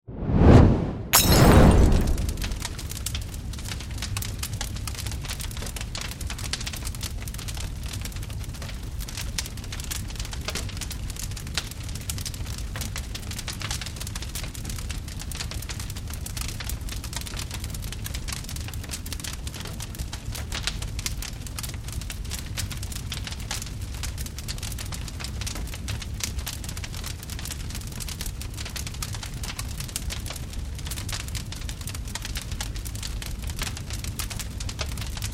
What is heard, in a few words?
Molotov Cocktail Glass Fireball With Fire Explosion Fire; Warfare; Blastwave